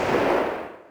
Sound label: mg bren ring out; WAR